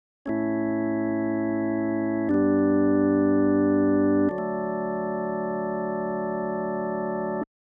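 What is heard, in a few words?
Organ, Leslie Speaker Emulation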